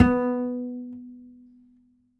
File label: Upright Acoustic Bass; 1ST POSITION; Larry Seyer